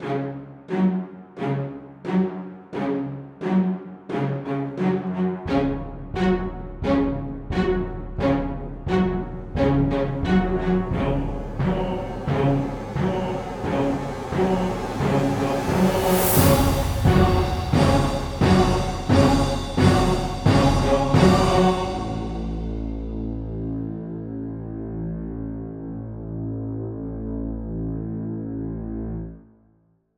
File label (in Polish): mix klasyk